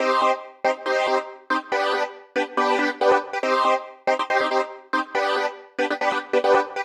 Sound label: Arp 140bpm C, Trance; Zero-G Trance Inducer; Arps 140bpm; 90 s Sample CDs